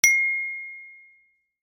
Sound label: ting